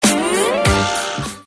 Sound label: SLIDE UP, GUITARS